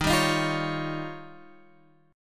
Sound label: D sharp 9th D sharp, Guitar Open F; D#9 strummed; JGuitar